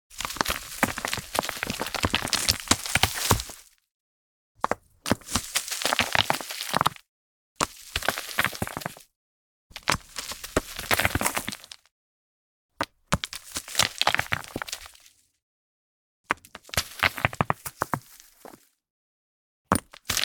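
Rock movement Stones Roll Down A Small Steep Rocky Slope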